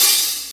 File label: open high hat sound a sharp key, open hat one shot tuned to the A# note